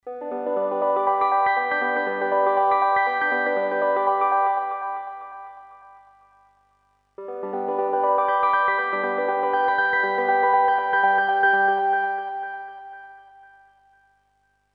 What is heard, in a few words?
ringtone